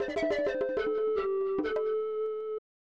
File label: Failure; Kakinoki Shougi; SNES